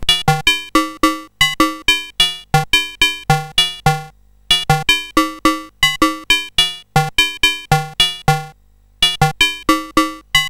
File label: Pots and Pans, CSG8580R5